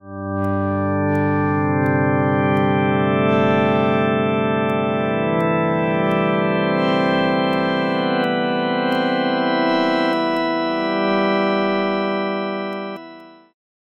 Surreal Atmosphere; Harmodion

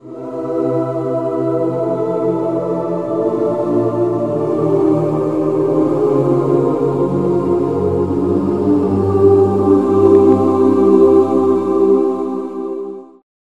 Necromancer Summons, Choir; Magnus Choir; Syntheway

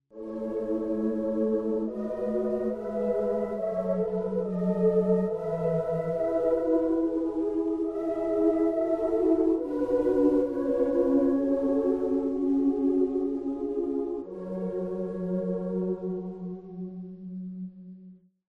Synth Vox; Syntheway

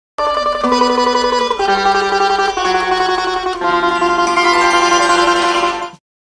sitar verb

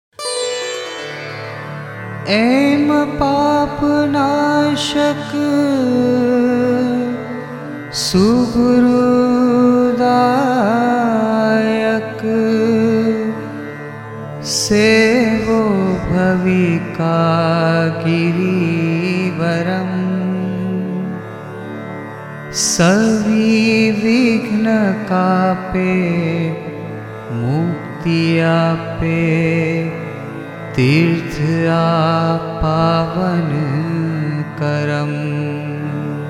GIRNAR CHALISA GUJARATI

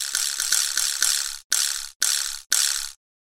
Latin American Vibraslap; Drumwavy; Syntheway

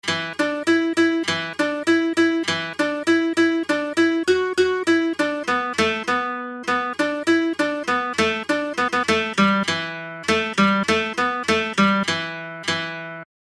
Traditional Japanese Folk Koto; ShamiKoto; Syntheway

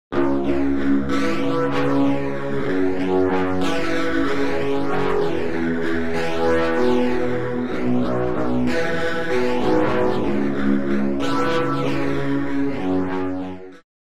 Rotary Speaker, Quantized Distortion, Guitar Wet; AmpVibe; Syntheway

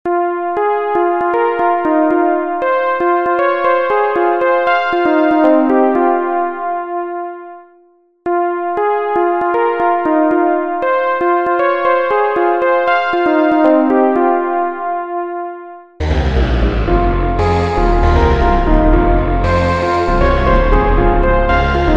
Vox Pad; Magnus Choir; Syntheway

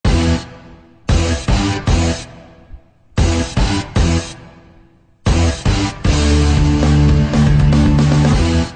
bang bang music

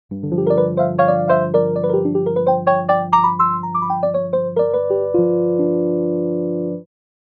Rhodes Mk1; ElectriKeys; Syntheway

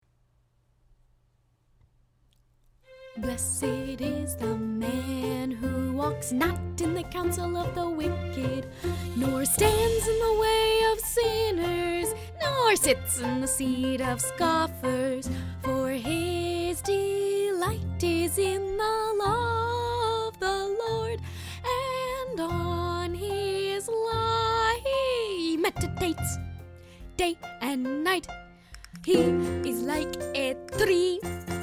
Music; Psalm

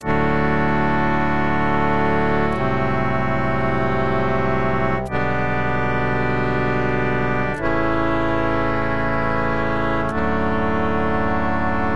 Vibratone Reed Aerophone; Harmodion; Syntheway

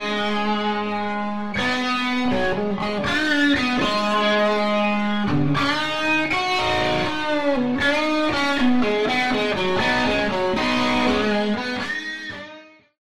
Rotary Speaker Booster Cataclysm Guitar Wet; AmpVibe; Syntheway

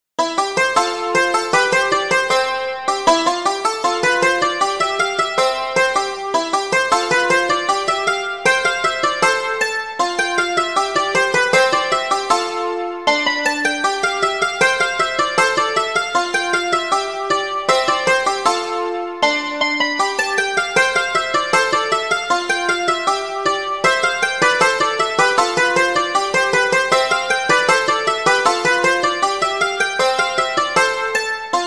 Electric Hammered Dulcimer; Chordophonet; Syntheway

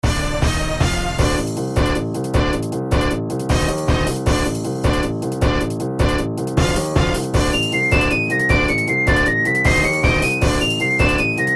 Strings Flute Piano Brass; Syntheway

